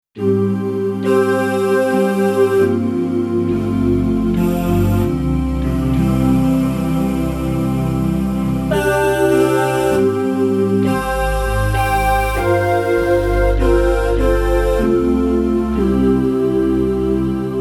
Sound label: Jazzy Ballad, Voice